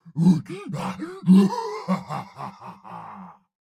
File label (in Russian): Турбосвин Смех Фраза